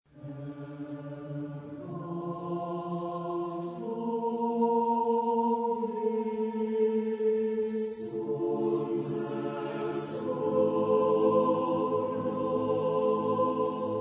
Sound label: Anthems, chorus; Mendelssohn; 6 Anthems Op79; Am Neujahrstage